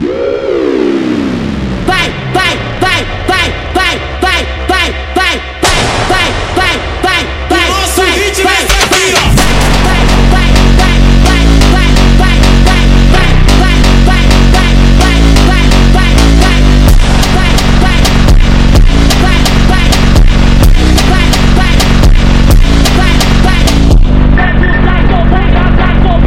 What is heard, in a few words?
Phonk Music; فانک phonk